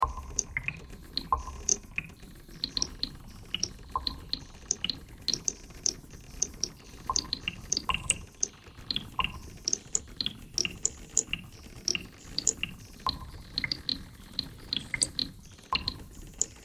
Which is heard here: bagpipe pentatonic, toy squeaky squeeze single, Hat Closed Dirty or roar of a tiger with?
bagpipe pentatonic